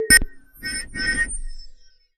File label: Button Press Digital C